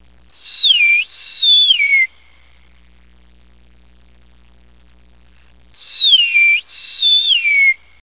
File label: meadowlark